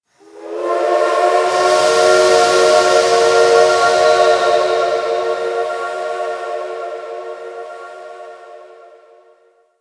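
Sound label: Shipyards Distant Train Horn; Warframe; Grineer Shipyards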